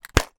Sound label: household box of pins and needles close